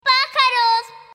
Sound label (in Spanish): pajaros